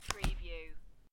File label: Playing Cards Deal Single Card; Turn Or River